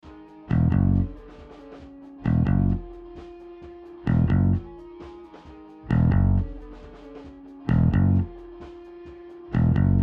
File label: Separation Bass